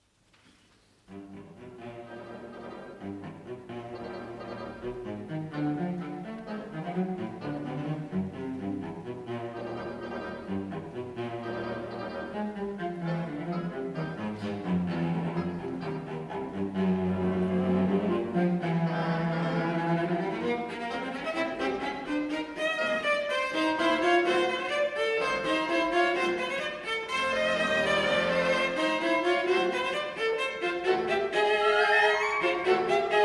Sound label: Cello Conerto No.1 Allegretto